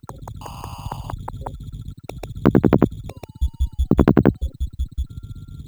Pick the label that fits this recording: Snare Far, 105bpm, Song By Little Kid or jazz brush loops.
105bpm